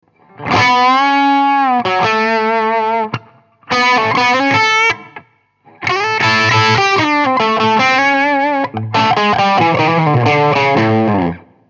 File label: bluelick; Rambler 25W; in an casual manner with a Shure Unidyne 545 microphone; The sound clips are recorded by Lehnert